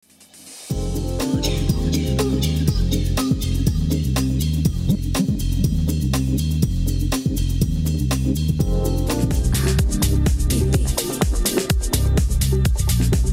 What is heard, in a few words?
Medley Bar Lounge